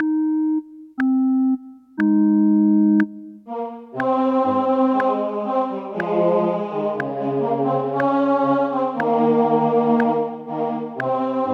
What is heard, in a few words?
O clap your hands; Gibbons